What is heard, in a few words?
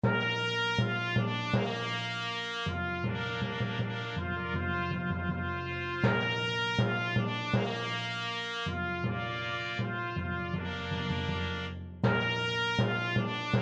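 imperial fanfare, 4 trumpets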